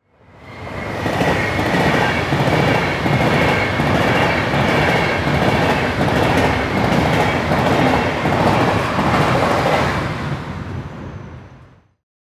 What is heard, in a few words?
train ice passing